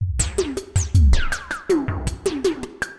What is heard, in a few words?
DRUM LOOP ambient 160